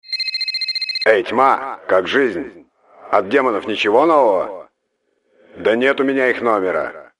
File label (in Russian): zvonok; Слова из игры "вар крафт"